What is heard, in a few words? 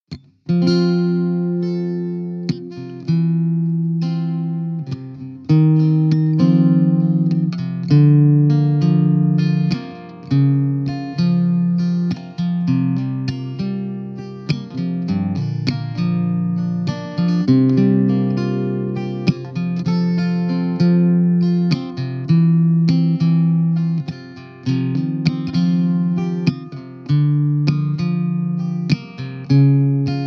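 F 100 guitar cliche groove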